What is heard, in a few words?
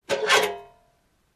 Old Mailbox